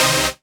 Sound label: Synths Root C